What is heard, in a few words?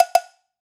perc dinner; Drum One Shots; SD MIDNIGHT Sample Pack; Slate